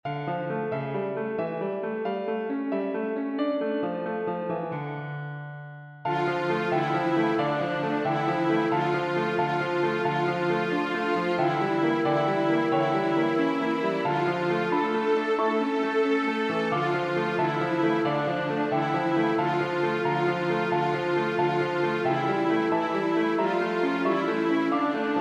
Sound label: Mary Lost Her Little Lamb w piano